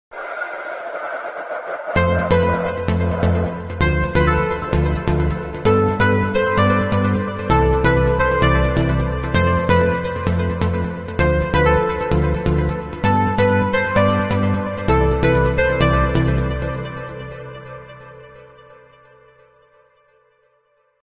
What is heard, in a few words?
Mobile Ringtone